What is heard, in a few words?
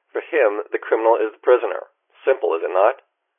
Synthetic